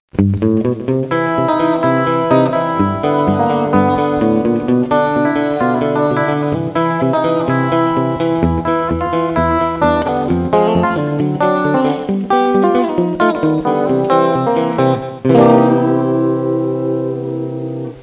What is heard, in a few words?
guitars